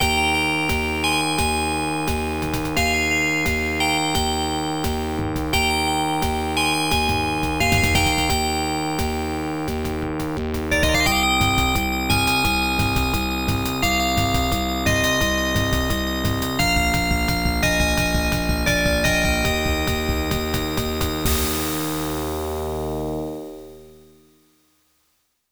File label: A Victorious Bell is Ringing; Sharp X1; herzog